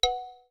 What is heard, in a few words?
Volume, ui; Asus Zenfone-10